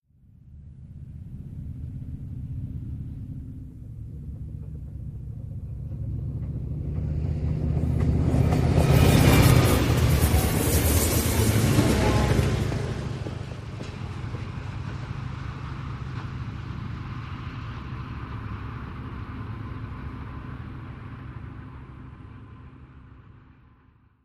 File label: Train By Heavy Close, diesel